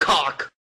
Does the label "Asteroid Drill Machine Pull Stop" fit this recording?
No